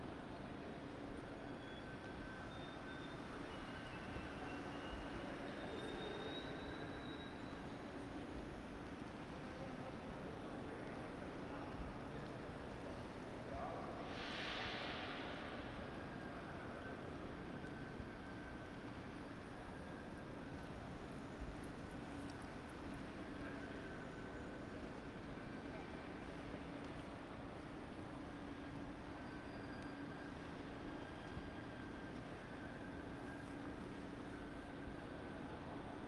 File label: ambience hall large entrance office building morning downtown chicago usa loop; fvtt sonniss gdc audio